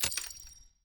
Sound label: ui investment